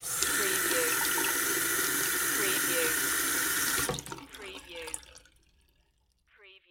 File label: Sink Faucet On Off; sound effects bible